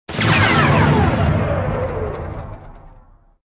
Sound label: Proton torp; RD501-Aux-Archive; RD501 Vehicle Weapons